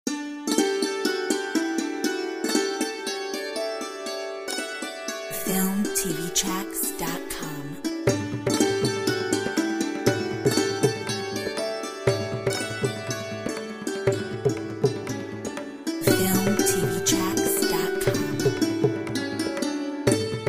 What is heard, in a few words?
santoor